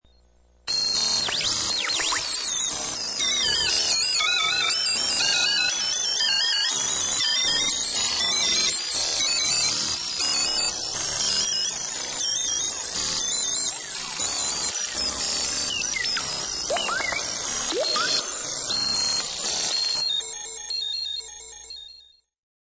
Robot Going Insane, Crazy freaked out buzzing sound of a robot having a total meltdown.; Robots